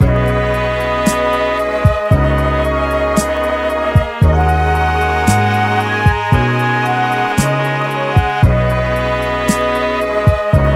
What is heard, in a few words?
Kisses C min 114bpm; Hersey; s950 magic vol 1